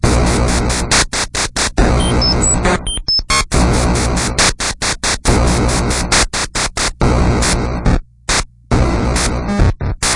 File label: deep drop